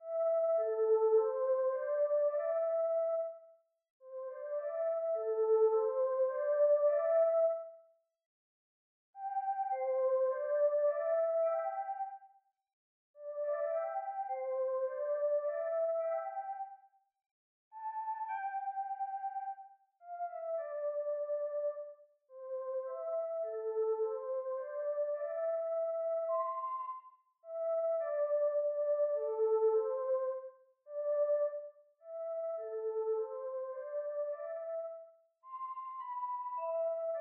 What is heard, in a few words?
Lullaby; Even the Ocean